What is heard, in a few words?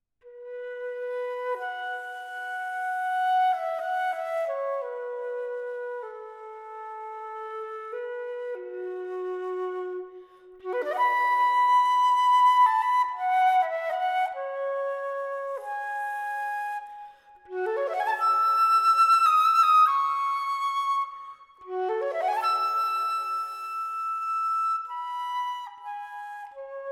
Meditative Garden